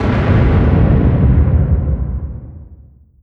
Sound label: distance ship hit big effect; Hearts of Iron IV Death or Dishonor